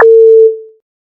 blip; hl2

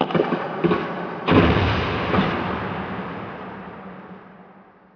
combat, ambient combat far; hl2

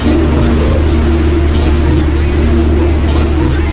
tower tone, city; hl2

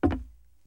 woodpanel, player footsteps; hl2

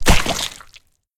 mud splat heavy; Hydrology Bubbles and Splashes; Soundrangers

